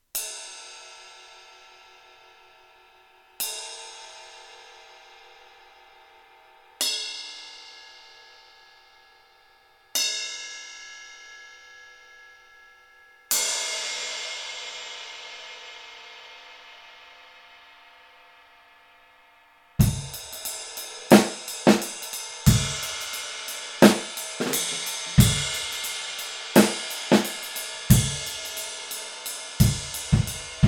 Rock Shiny Ride 20; ride bekken; Amedia; Vigor

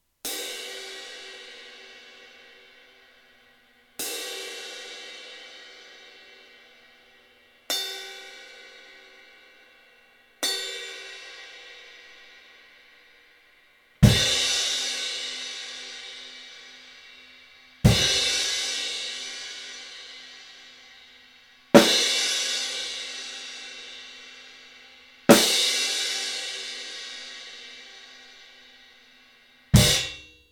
Classic Crash; crash bekken 18; Amedia

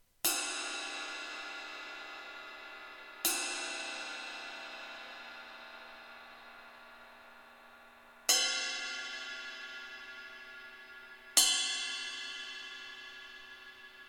Rock Ride 24; ride bekken; Amedia; Vigor

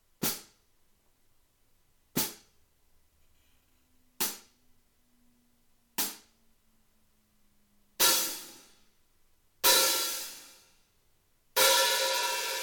Hihat 14; hihat bekkenset; Amedia

